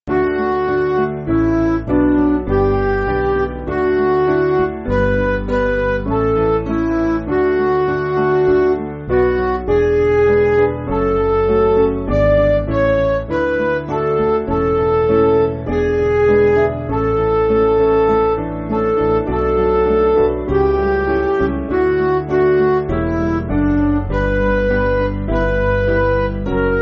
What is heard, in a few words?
Midi, Music Backing